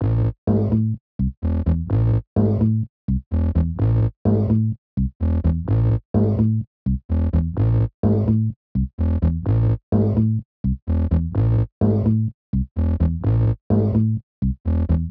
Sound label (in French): basse